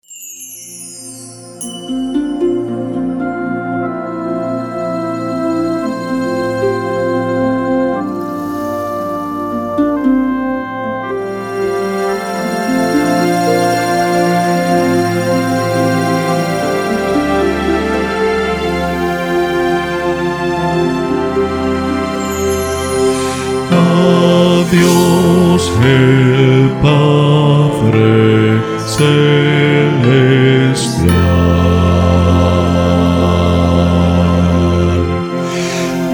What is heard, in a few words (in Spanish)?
BAJO; coro; Doxologia; Coro Conferencial